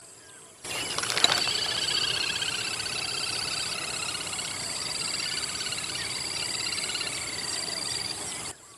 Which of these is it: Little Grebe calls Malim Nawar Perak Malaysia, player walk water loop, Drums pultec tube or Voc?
Little Grebe calls Malim Nawar Perak Malaysia